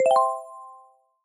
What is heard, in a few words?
win count; roulette